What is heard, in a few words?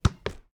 dribble hop step; nova sound